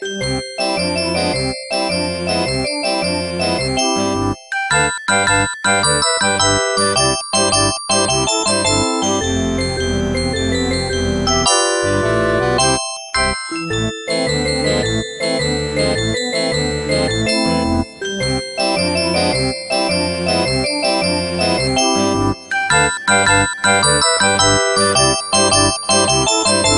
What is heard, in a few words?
Tone For Android